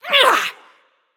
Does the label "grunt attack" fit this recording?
Yes